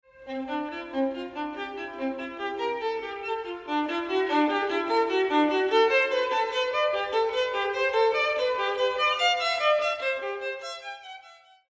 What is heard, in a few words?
Violin Sonata; Bach